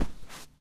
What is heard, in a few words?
padded footsteps; zps; Foley